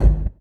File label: One Shot, Hits